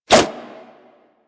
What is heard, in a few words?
Nunchuck Whoosh H, Infested, Weapons; Warframe